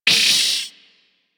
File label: Vocal Pain; Warframe; Amalgam Moa